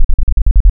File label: Sine To Oct Sine Half Zeros, wavetables; Animoog